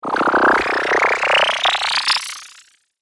Teleport, science fiction

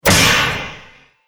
Lance Impacts Plate Mail Armor

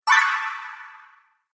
Santa Winter Spell, magic

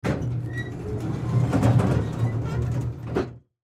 Service Elevator Door Open; Elevator Doors; Industry

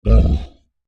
Creature Large Grunt Short; Monsters; Sound Effects:Horror; Big Room Sound

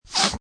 Snort Sniffle

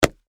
Footsteps, Combat, Boots, Stomp, Single, Concrete

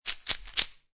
Poker Chips Rattle Chips In Hand; Gambling; Casinos

